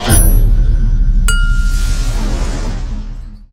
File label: Mision Complete